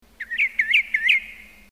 peresmesh

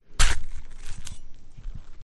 vonzili noj

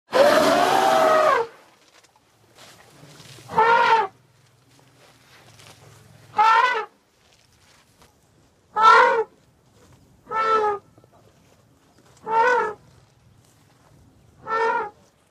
zvuk slona

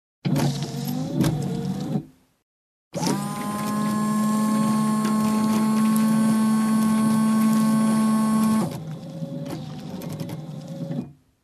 Avto dvorniki zvuk podachi vody

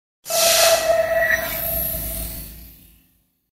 perehod kiberpank

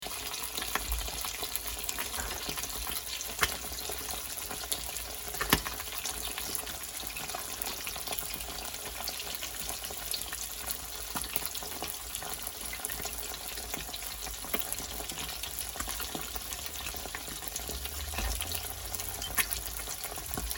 yaichnica zharka; kurinogo yaica

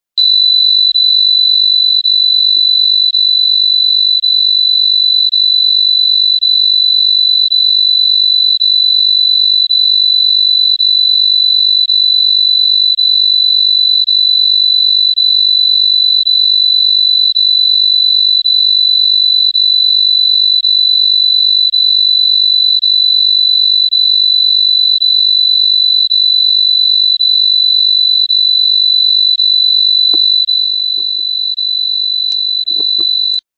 otpugiv komarov

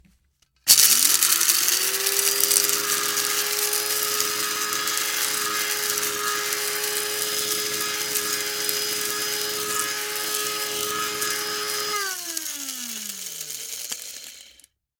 kofemol

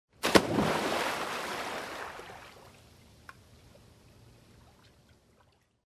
zvuk pryzhka v vodu na juvot

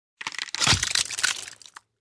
zvuk shaga skeleta

